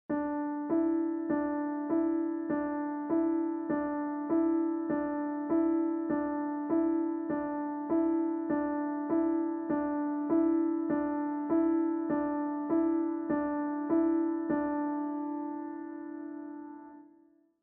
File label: Counting in Twos Pattern B Accompaniment